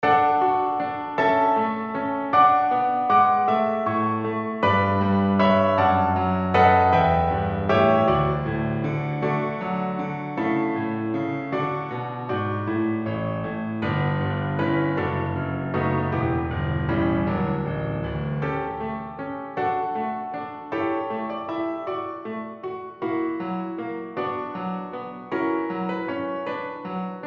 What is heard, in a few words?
Menuet in D for String Quartet piano; F.Schubert; String Quartet D.86; piano transcription